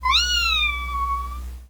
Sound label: Miyaaaaaav